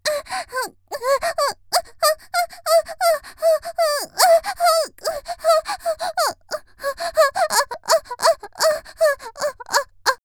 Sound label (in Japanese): 「喘ぎ」激しい10秒; 綾波系少女